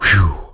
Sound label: whew; Duke Nukem; Manhattan Project